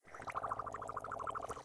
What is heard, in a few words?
Straw; blue archive